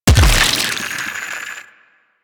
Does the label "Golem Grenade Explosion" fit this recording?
Yes